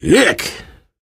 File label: reaction disgusted, biker, voice; source engine; left 4 dead 2